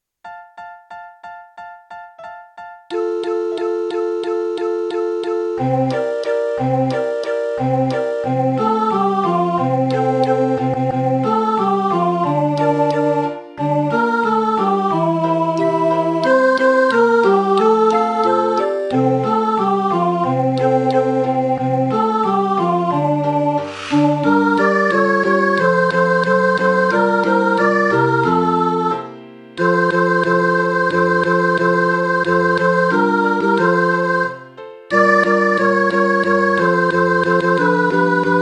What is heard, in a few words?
tenor